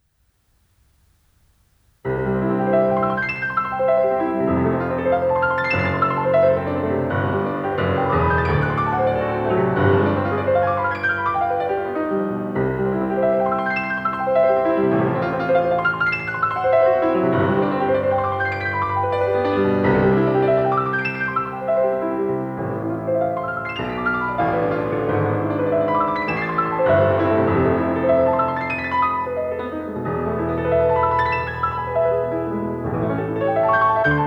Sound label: Etudes, op.10 - No.1 in C major, Piano; Chopin; Claudio Arrau